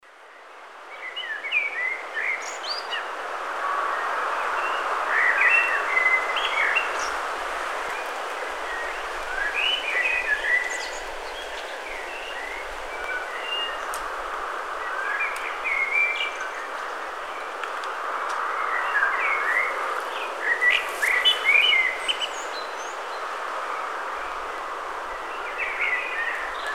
wind through grass birds

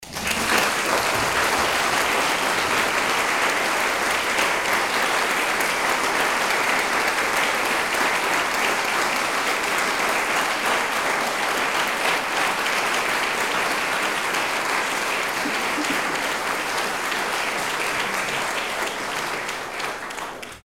applause

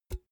empty can pick up hard

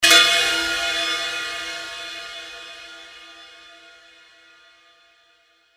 ambient metallic ambient impact

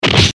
eastern fist hit seq

